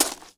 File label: gravel step; Golden Crayon Sounds